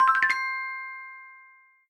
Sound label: chip minor